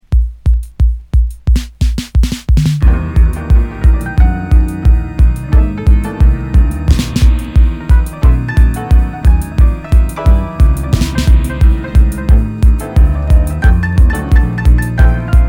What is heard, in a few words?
monofonic orchestra